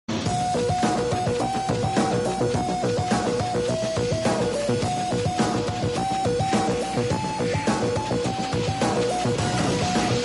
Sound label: attitude bgm ringtone; billa